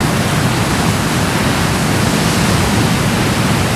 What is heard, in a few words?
waterfall layer